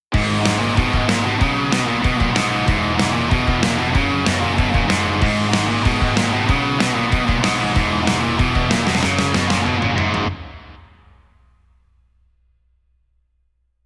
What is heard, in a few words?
drum Comp Off